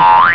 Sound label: Boing